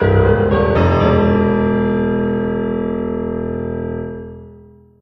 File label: boomer bacteria, music; Left 4 Dead 2; Source Engine